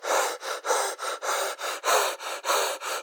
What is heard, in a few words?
voice male breathing mask loop run; sonniss gdc